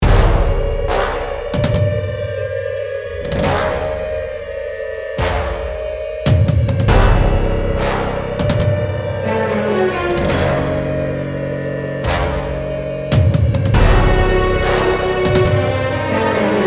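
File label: Music Ambient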